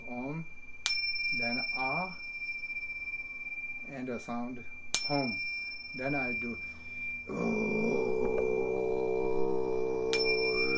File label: chanting mantra cymbals